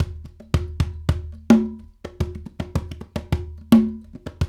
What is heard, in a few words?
World Dance; Spectrasonics - Supreme Beats; Compasa